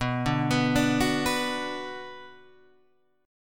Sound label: B Minor B Guitar Open G, chord